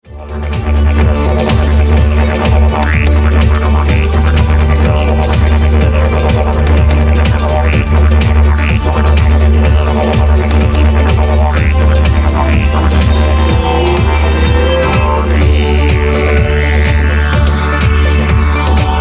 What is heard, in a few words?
Electric